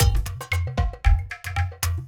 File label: Tabla Groove 115